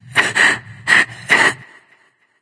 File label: boo hoo; smite; jingwei